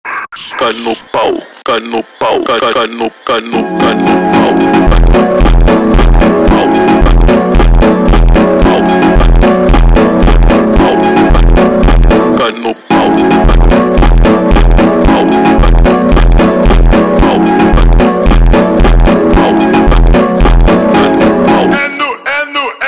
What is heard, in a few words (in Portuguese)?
Baixo Teclas sped Up